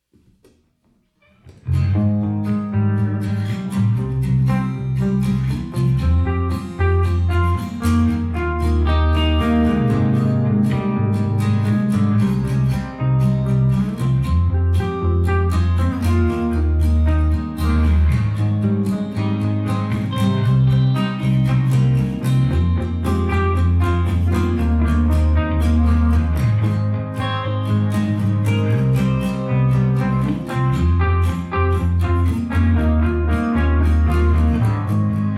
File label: Emo Guitars Melo Bass ohne Drums; OF FATHERS AND SONS – Soundtrack